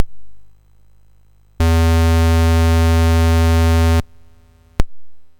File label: sid MOS6581R4; Rendezvous with Rama